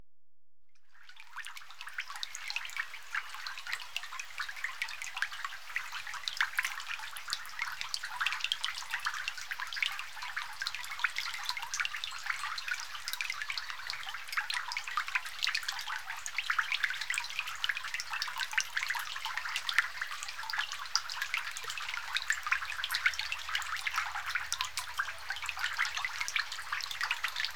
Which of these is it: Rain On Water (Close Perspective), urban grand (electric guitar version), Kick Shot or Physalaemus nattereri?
Rain On Water (Close Perspective)